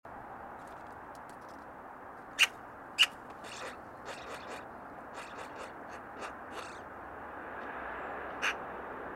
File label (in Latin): Pica pica; SETE